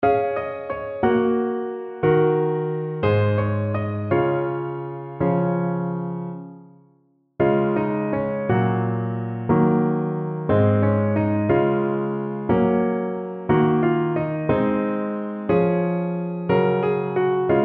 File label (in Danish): Klaver 3 stemmer Db dur Dyb 3 vers; Gruppen.